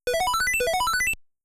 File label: Learn PSI; mother1